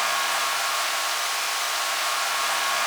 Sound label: Cym Mic Bleed, Noises, Organics; Xfer Records Serum; Serum Presets